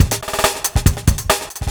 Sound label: Loops Fills; Vengeance Samples